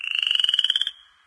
jungle question; windows 9x; sound schemes

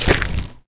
paint, footsteps

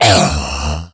death, infected

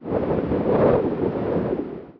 wind hit, ambient

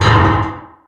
metal frame groan; Source Engine; Portal 2

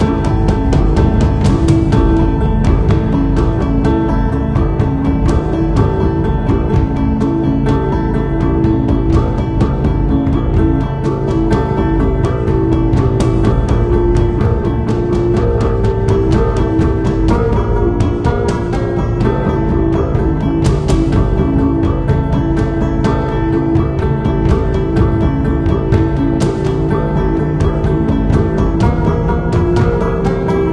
pray for passing; Left 4 Dead 2; Source Engine; video games

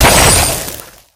turn to gold, weapons; saxxy; tf

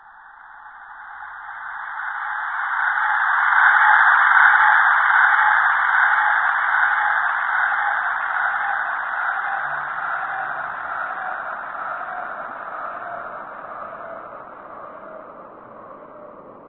citadel flyer, ambient; source engine; hl2

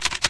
keyboard clicks; ambient; Source Engine; video game

